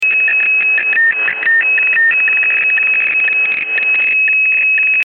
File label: weather sounds fax